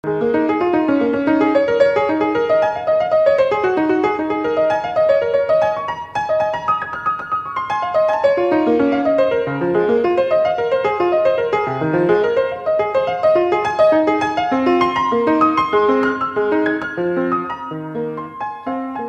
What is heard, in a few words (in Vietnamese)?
nhac khong loi viet nam; VOV3